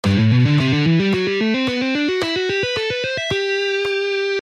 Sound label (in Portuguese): Exercício tapping